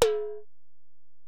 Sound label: Tom, Alesis DM-PRO